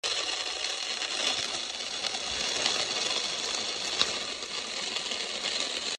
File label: wheel chain